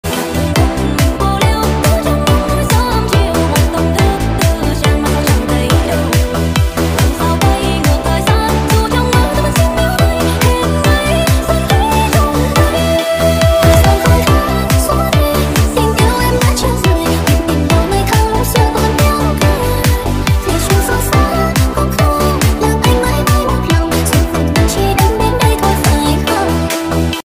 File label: remix